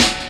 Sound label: Snare Lo, Klanga, drum loop hits; Zero-G Jungle Warfare 1, 2 & 3; Percussion Hits